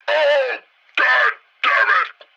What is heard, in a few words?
hit yell; Blind Drive